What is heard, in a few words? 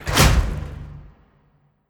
Sarco Slam